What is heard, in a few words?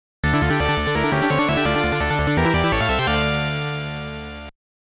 All Clear; Akumajo Dracula X Chronicle